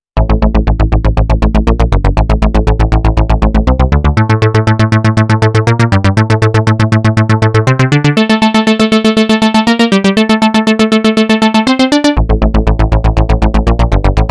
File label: synth